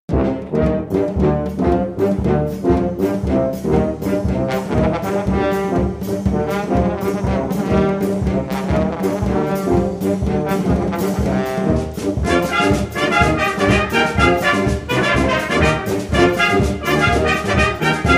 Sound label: Mariachi